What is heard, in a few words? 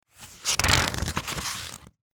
Turning Paper Book